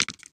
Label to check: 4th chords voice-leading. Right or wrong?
Wrong